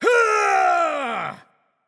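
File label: nonverbal battlecry; voicepack; smite; merlin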